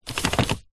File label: vehicles car fan mode dial turn toyota rav4